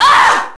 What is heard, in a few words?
female get hit; enemy; Max Payne